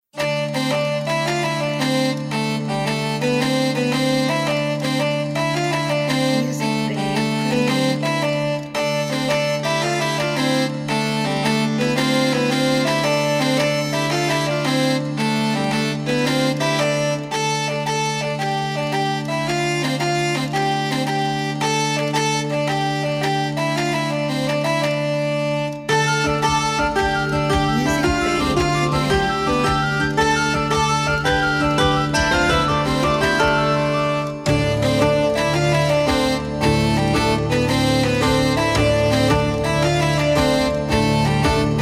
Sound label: Pirate Tavern Music